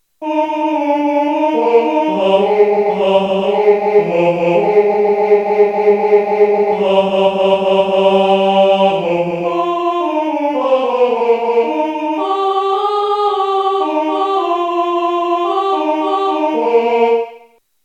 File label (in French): fanfare tenors; midi